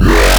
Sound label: Growl